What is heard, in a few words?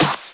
Clap; Vengeance Samples